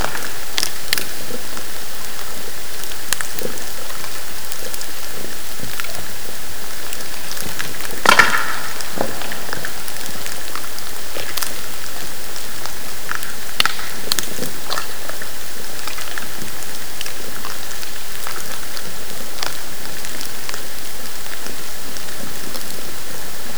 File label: TC transient, hydro events